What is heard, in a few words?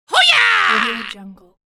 ninja shout